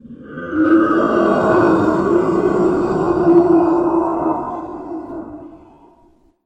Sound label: end of a monster